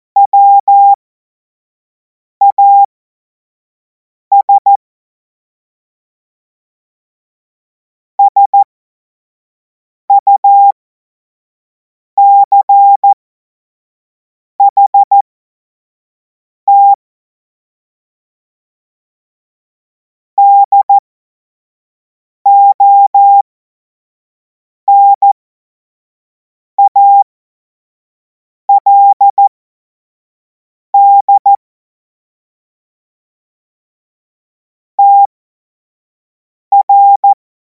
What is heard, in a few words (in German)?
Witz, Morsekurs, cw morsen; hamradio; cw witze